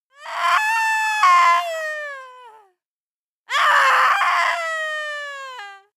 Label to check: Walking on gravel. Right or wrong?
Wrong